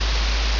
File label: ambience room tone